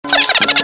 laugh

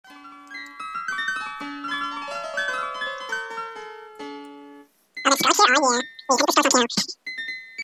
piano

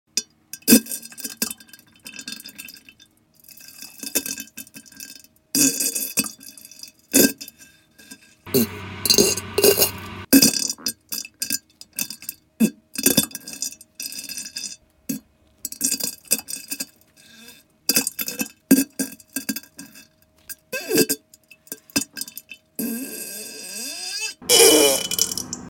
diarrhea

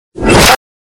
hit

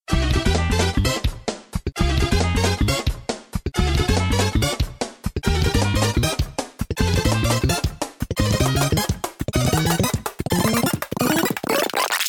course clear

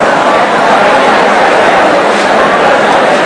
crowd

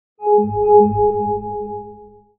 ping

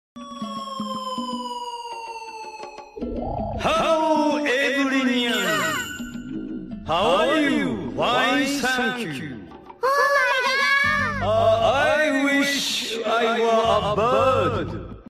bird

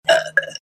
burp

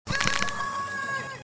ahhhh

